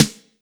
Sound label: BEAT SD